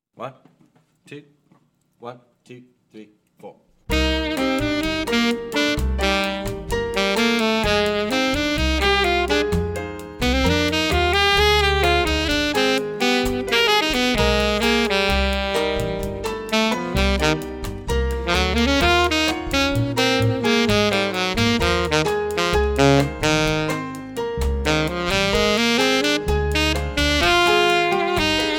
latin etude alto sax

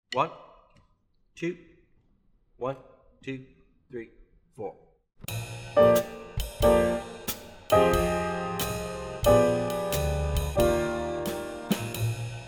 swing bass muted

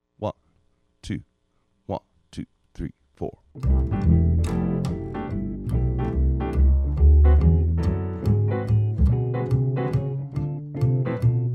swing rhythm section drums muted

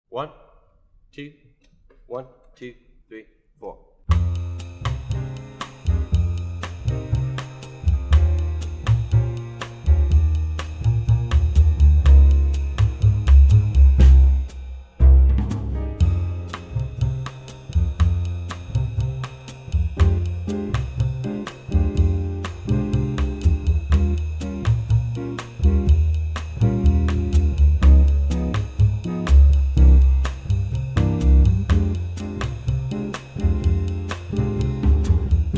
latin piano muted

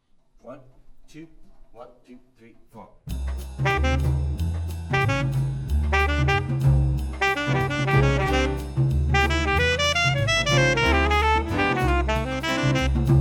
latin etude alto sax

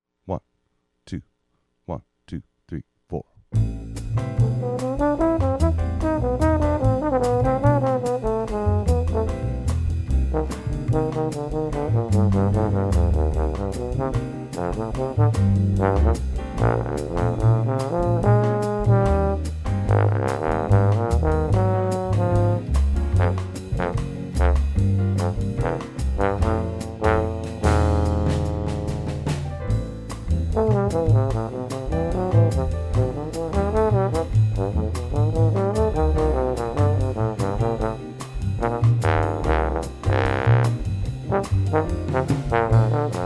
latin bass trombone

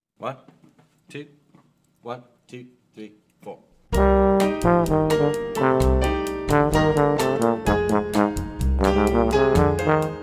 latin etude bass trombone

